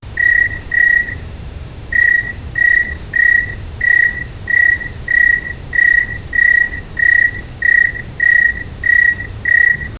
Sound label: crickets and faint insect